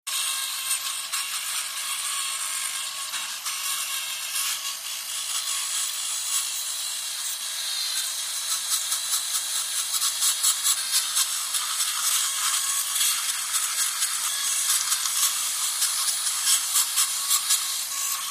HOSPITAL EQUIPMENT DENTIST: Cleaning teeth with buffer on electric drill.